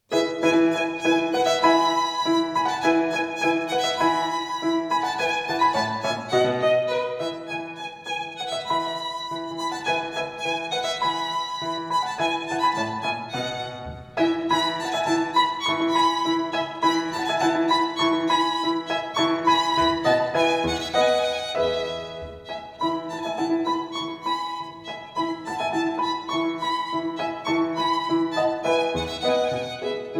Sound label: Piano Violin